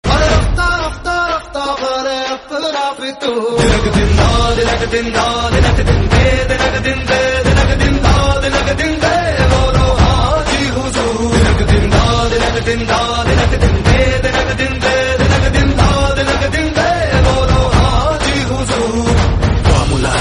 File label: Hindi Ringtone; Shamshera